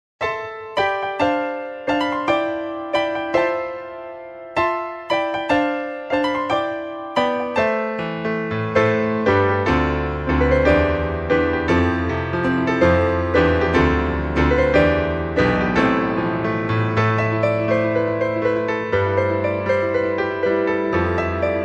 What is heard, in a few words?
Piano; Castlevania